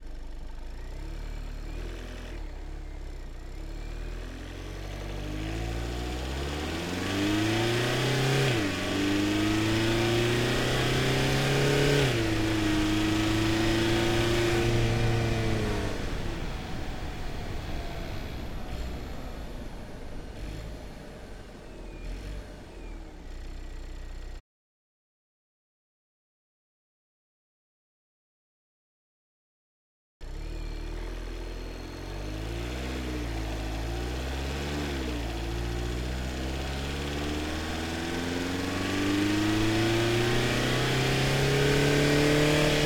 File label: VW Golf Diesel, ONBRD, MEDIUM, Drive, ENGINE, Center; VW Golf Variant TDI 2019; MKH8020; Pole Position Production